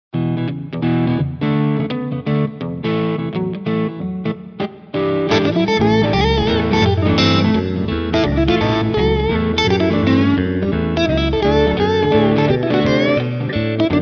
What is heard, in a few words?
Total Guitar Bundle; Ilya Efimov Production